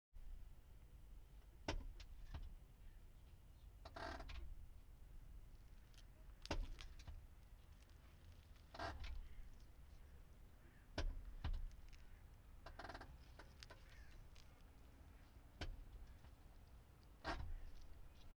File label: BMW 520i Details Handbrake; FLYSOUND